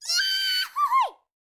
YODEL YELL; ILIO; Vocal Planet VOL-5

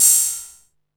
HAT, Techno 138 BPM; Best Service ProSamples vol.54; AKAI

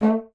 bassoon A3; Xsample VOL-6; C BASSOON

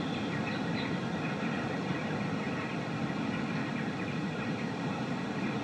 Ambience Loop, outdoor

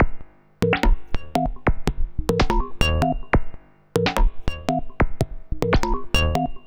Mod Loop, Modular Percussion; Sonic Foundry (Sony Creative Software); Synchro Funk

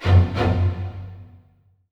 FULL ORCA D; East West; Symphonic Adventures

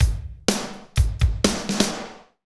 Medium Tekno; Northstar - Drumscapes Roland

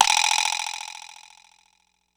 Vibraslap, Ensoniq ASR-X Pro

